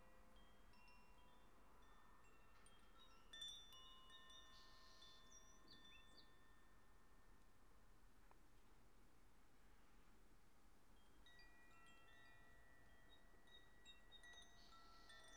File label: koshi chime w birds